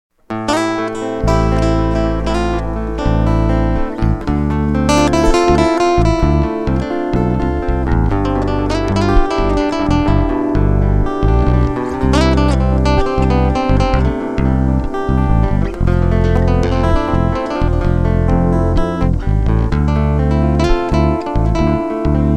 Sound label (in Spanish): musica requinto y bajo, guitarra